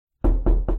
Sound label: knock